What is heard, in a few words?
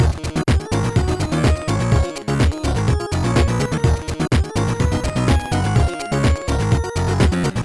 quick eurobeat